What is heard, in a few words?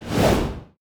BOSS Atk